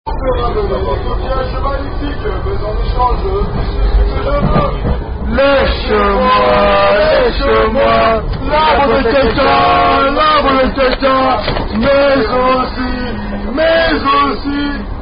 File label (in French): Chanson Rugby, French Rugby Song